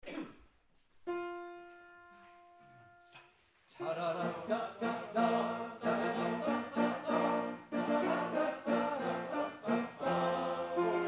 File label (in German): tutti